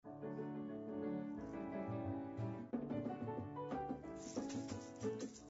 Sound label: jamaica bgm